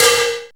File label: Perc FX, Percussion